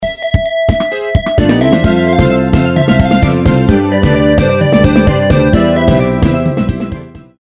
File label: samplebase low